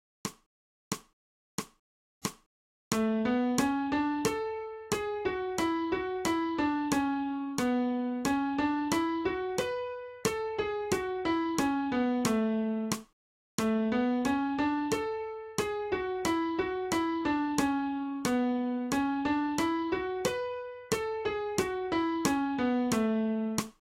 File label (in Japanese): 新フレットレス